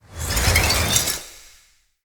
Dendrit Staff Caster Charge Heavy Run Strike; Weapons; Staves

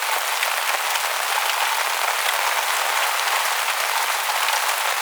Shipyards Rain Tarp Surface; Exterior; Warframe; Grineer Shipyards

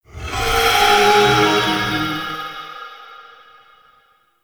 Eye Teleport; Warframe; Orokin Moon; Ambience

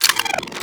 Pistol Reload; Warframe; Sapient

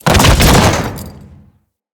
Fall, Body Falls; Grineer

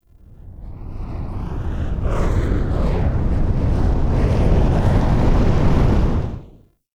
Hazard Charge Up; Ember Prime Boss; Warframes

